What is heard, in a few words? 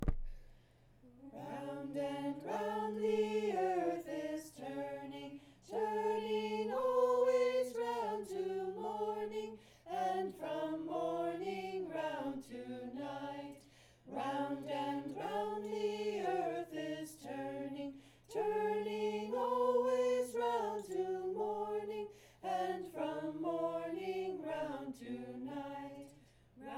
lantern walk, round and round; Cincinnati Waldorf songbook